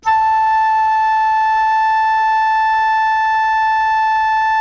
FLUTE MF A 4; Greytsounds - Philip Wolfe David Crozier's Saxophones

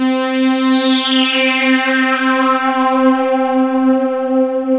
SPACE SWEEP, ROLAND D-50; Rubber Chicken Software Sample Bank

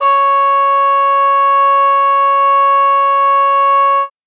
trumpet c#5, Trumpet cup mute

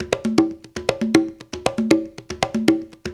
TAP, African Tap; Spectrasonics; Supreme Beats

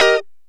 Lounge Guitar Chick Major D#3; Zero-G Kocktail Kollection

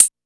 KORG 01W KIT; Rubber Chicken Software Sample Bank

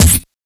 combidrums, techno; single-drums

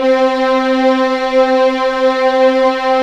C4 LEG F, VIOLINS; East West; The Ultimate String Collection

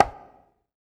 BONGO, HR-16 Drum Mashines; EdgeSounds; Drum Mashines VOL-1

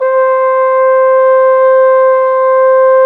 TRO, Trombone; Sound & Vision - Gigapack I CD 2 (Roland); Brass&Woods2

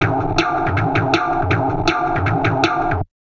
DIST, Drum Loops Crazy Processed

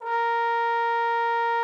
trombone A#4; Xsample VOL-3

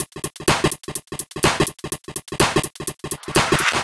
125 No Bd, Drum Loops 125bpm; Disco2Disco; Nu Dance Electro

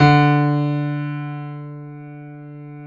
PIA PRO, Roland

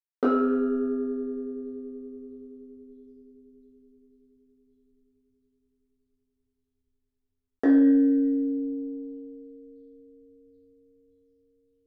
Gamelan; Propeller Island - Complete Gamelan

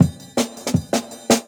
162, Jungle Breaks; Original Jungle Breaks

